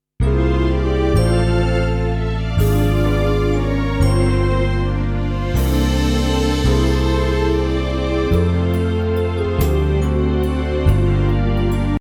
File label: xmas waltz